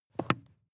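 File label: sports car interior pedal clutch step hard; verkehr transport; autos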